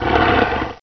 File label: gonome pain; mystic radar; mr zombie1